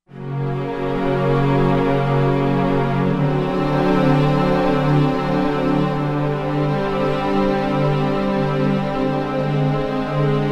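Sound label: Strings Swell String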